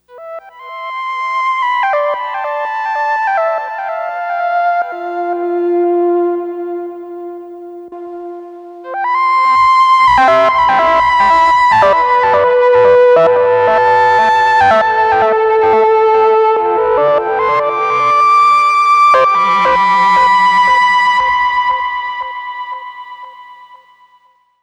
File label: synth